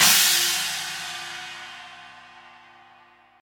Long Tail Reverb Drum Crash G# Key, Royality free crash single shot tuned to the G# note.